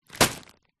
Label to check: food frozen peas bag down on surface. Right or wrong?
Right